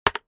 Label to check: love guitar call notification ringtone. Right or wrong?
Wrong